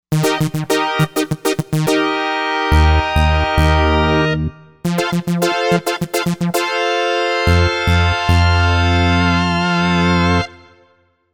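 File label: Pulse Organ Brass, synth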